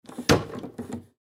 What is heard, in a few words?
Foosball Table; Foosball Table Players Move; Games; Sports